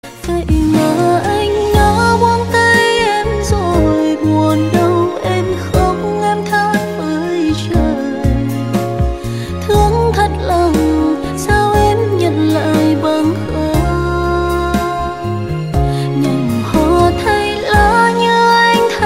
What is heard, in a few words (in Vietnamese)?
nhac chuong cover